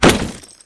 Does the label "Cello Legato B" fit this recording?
No